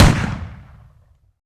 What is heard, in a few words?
Shotgun Boosted; DoomRTX; Shotguns Boosted Intense